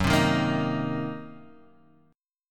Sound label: Gb 6th Flat 5th Gb down Guitar Standard; strummed; Gb6b5; JGuitar